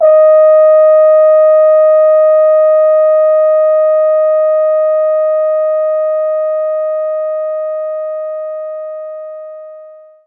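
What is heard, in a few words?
French Horn mf D#4; knights try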